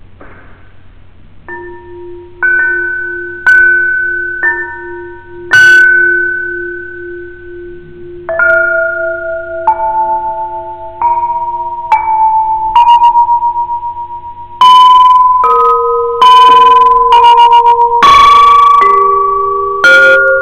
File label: xylo